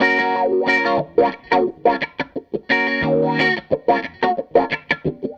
Strat Guitar Loop

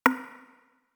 Percs, Elektron MachineDrum SPS1 MKII

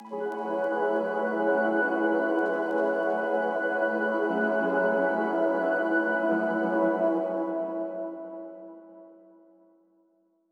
DMaj light piano

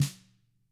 TAMA SNRD, TAM HIT; ILIO; Double Platinum Drums 1

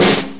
Snare, Snare Drum; Drum Tools

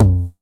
909 TOMS

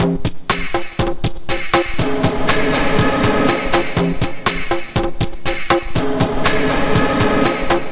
Mambo 121, Lounge Drumz

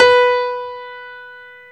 Keyboards Stereo Grand; E-MU Producer Series Vol. 2 – More Studio Essentials

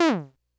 Bleep, Korg DS-10

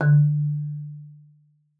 MARP D3, MARIMBA; Xsample VOL-4

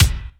KICK ROOM

